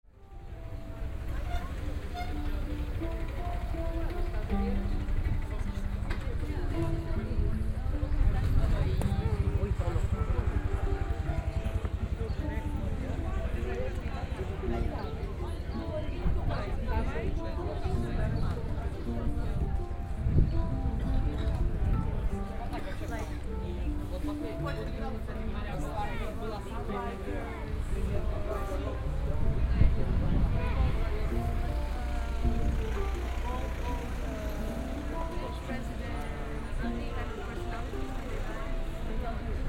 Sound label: lissabon flohmarkt